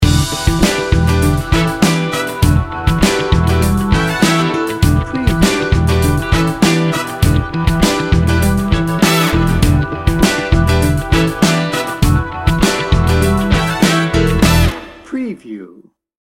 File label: funk factory